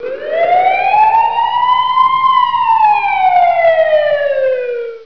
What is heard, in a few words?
siren